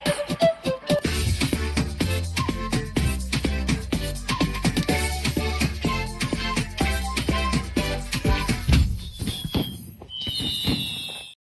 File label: super happy magic forest theme instrumental